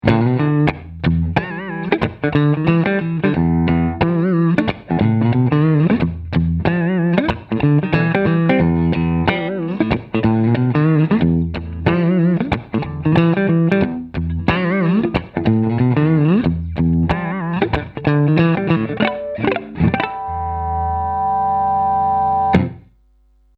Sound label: Clean Neck